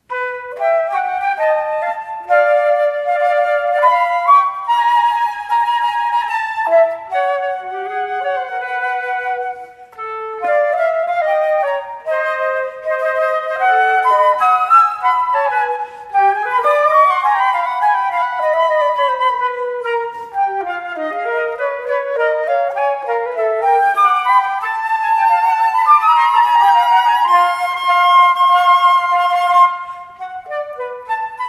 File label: jazz duets for the flute